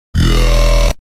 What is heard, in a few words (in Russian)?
Бэээ; Мемные звуки для Роблокс